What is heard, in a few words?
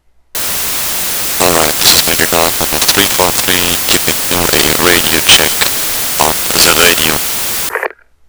noisy; arma2